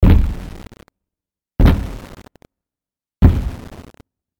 Tiếng bước chân của quái vật khổng lồ

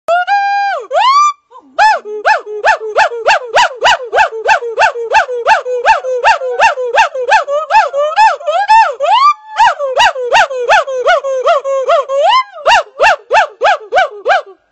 Tieng vooc keu

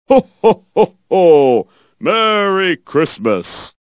tieng cuoi ong gia noel

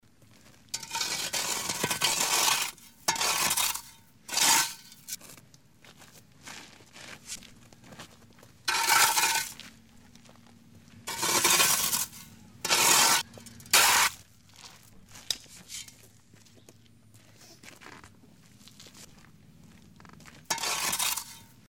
Tieng dung xeng xoi dat trong cay

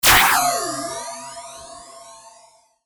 tiếng chùm tia laze ma thuật phát ra

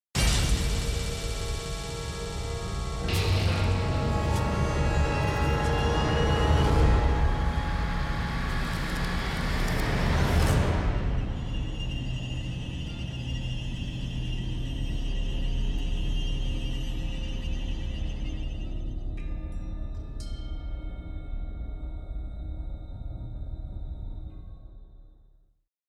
Âm thanh căng thẳng kinh hoàng

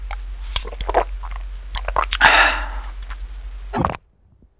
Tieng oc oc khi uong nuoc

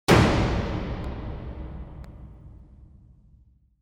Tieng kim loai nang va cham keu

